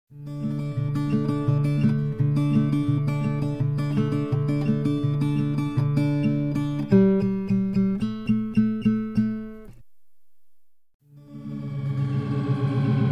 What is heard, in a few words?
Acoustic Guitar